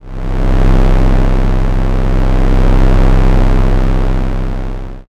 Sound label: space engine